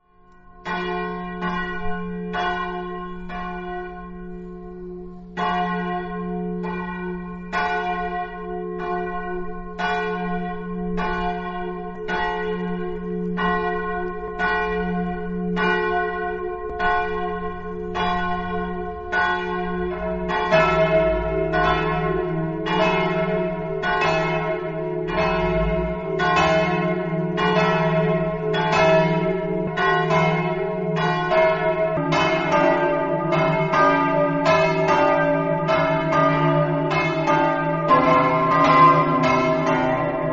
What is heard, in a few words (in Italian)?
pradamano, campane